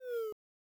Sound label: Snore Loop